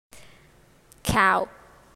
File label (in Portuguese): vaca